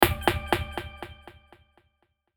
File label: Hit Drum Squeak, Hit Drum Squeak Delay; Blastwave